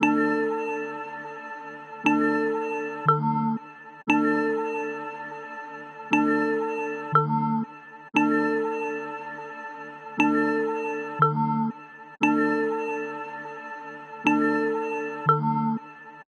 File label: 118 BPM D Min Mallets; Melody; Loop Stems; Cymatics; 2022 Melody Collection Platinum Expansion; Off White